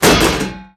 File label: shield heavy bash; Skyrim Special Edition